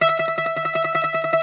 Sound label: Greek Bazouki, Plucked Strings